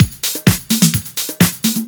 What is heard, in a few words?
Housebeats, 128BPM; Vengeance Samples; VEH2 Loops